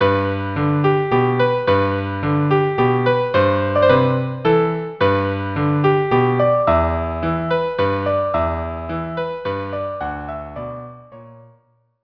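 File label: waltz violin cello duo; Brahms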